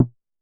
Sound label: Drum X Conga; Pearl Drum-X